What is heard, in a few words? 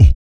VOC PERC, Vocal Planet Jazz FX BASS PERC; Spectrasonics Vocal Planet